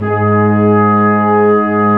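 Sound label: Brass Ensemble long; Roland L-CD702; Lrg.Ensemble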